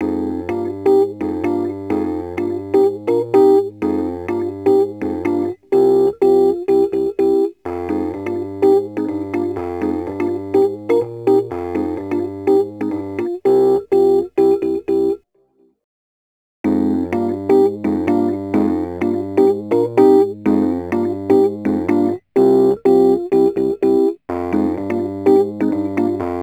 Rhodes Attacks; Ueberschall

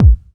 Bassdrum Clean

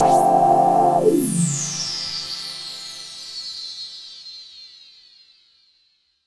Epic Pad, Underground UK House & Electro, Pads; Sonic Foundry (Sony Creative Software)

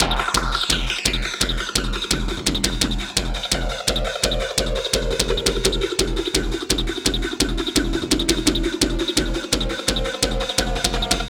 loops 170 bpm; MEGA DRIVE; Masterbits - Tekkno Trax

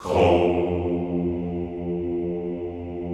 HOUUU, Classical Choir

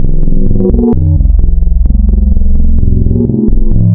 Spectral, wavetables; Architecture 32

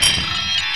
CHISEL PRES, MACHINES; Rarefaction; Poke in The Ear With A Sharp Stick

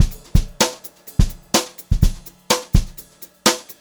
Brushed Drum Loops; USB Soundscan vol.39; AKAI

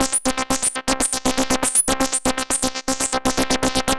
Synth; Sonic Foundry (Sony Creative Software); Prototechno; Synths

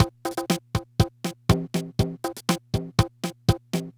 Loop, dd-5

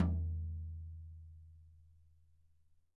Tom, EMU PX-7 Drum Machines

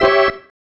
Stab, Organ, One Shots

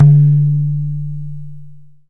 DIN, Tabla; Mango Loops

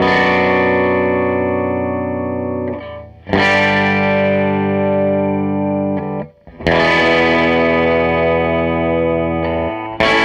SG Guitar Loop

